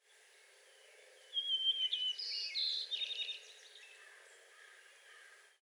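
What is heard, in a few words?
White-crowned Sparrow One Song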